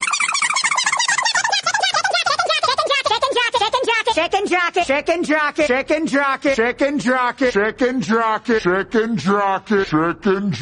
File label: Chicken jockey fast to slow